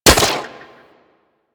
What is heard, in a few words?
Speeder Rifle Fire